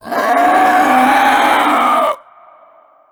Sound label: leaper dead; trespasser